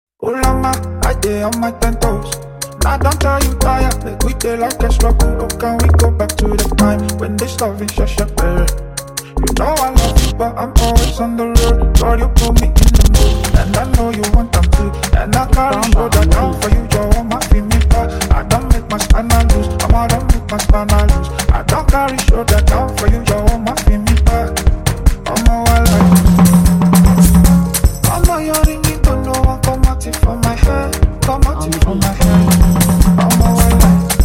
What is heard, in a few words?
Street Beat; A-talented Nigerian beat maker